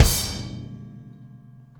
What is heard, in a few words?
Combo Shard, Processed Drumkits; Sonic Foundry (Sony Creative Software) - Processed Drumkits Zero Gravity Beats; Shards